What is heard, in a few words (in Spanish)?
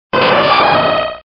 Grito de Scizor